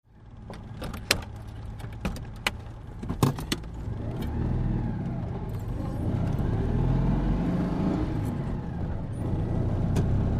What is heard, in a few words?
Citroen Deux Chevaux